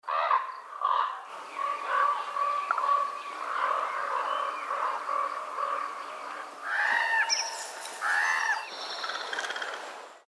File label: raven common; species/birds; wbba